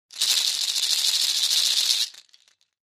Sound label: Cabassa Shekere Shrt, percussion shaker